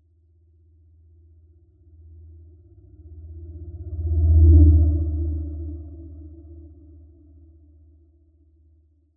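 spacecraft or other vehicle pass by left right hum; science fiction; zapsplat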